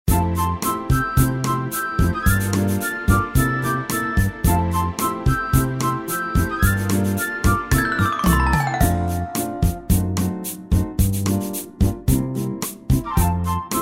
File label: Keyboard jogging Bossa; pistas-piano